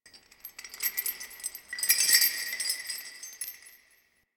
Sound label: Chain E, Throne Room; The New War; Sentient; Ballas